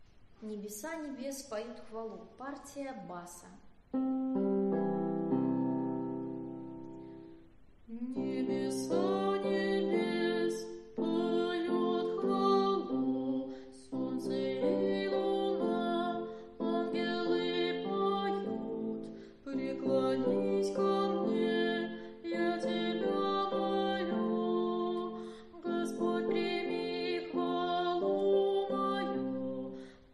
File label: bas; Nebesa nebes